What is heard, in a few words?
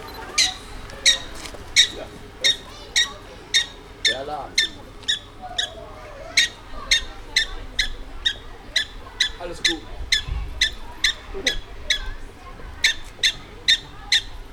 Cynomys ludovicianus alarm